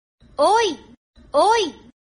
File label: funny sms notification tone ringtone; oii oii